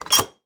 COGER BARRA METAL

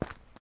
Paso zapatill cemento; yesterday origins gamerip 2016 switch ps4 pc ios macos

